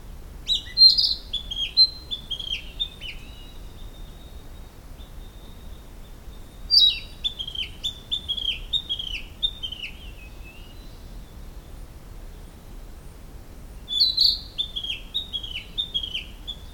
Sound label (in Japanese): キビタキ; ポッピリリ、ピピロピピロピピロ; 多摩森林科学園; 森林総合研究所 多摩森林科学園